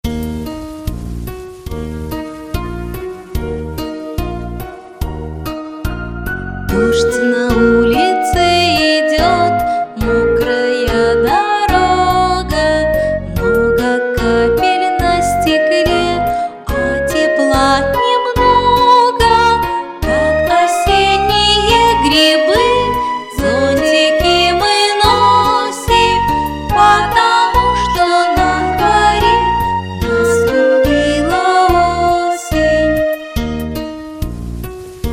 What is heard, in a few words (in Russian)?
pesnya